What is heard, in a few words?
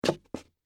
High Heels Thick, Footsteps, High, Heels, Thick, Heel, Scrape, Wood, Surface; Footstep Sound Effects; BlastWave